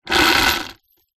industrial chain metal medium move over wood rattle